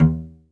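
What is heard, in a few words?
SOLO CELLO, Synclavier Strings; ILIO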